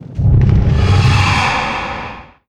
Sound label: HOLY STONE KEEPER war damage